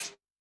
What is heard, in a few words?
Brs Up Rim, Yamaha DTXpress-II snare